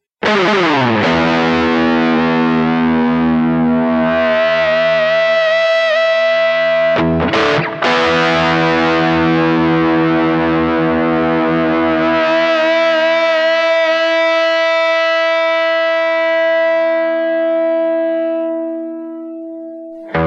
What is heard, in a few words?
DI recording; vibesware gr junior